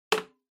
Tabletop Timer Digital Put; 卓上タイマー デジタル01-13(置く); タイマー機器

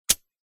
Padlock-unlock

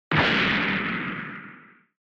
Retro Anime Gun Short

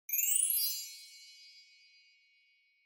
Wind Chimes, Gentle Up High

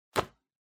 Book (Put Down)

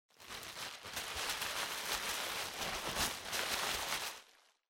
Bubble Wrap Rustle; 気泡緩衝材; ガサガサ; 梱包材

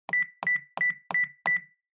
Telephone Hardware Button

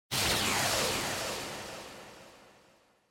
Motion Speed Lines Mid

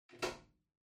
Wall Outlet Far Wall Plug In

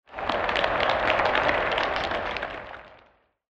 SNES Golf Cheer

